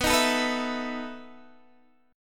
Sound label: E Major 7th Suspended 4th, B